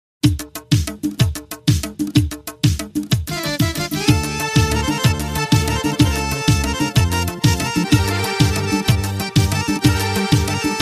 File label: Accordion Flute